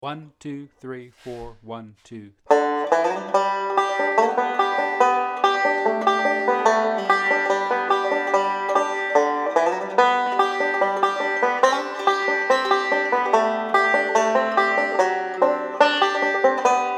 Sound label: Red River Valley banjo